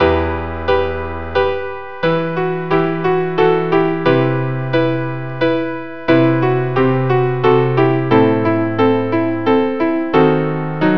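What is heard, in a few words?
string quartet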